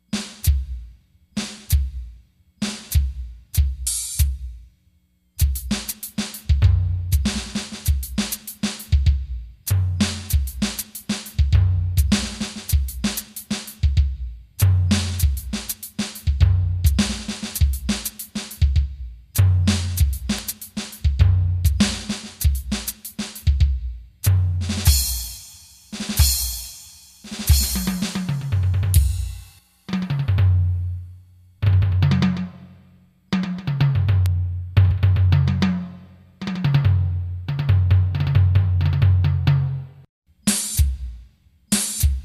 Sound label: jazz; Jazz beat